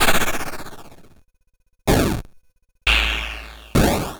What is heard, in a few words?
Drums, Hits; DCM8